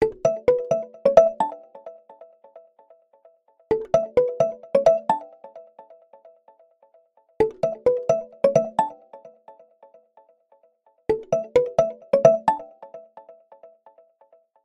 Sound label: Tap, alarms; Huawei P30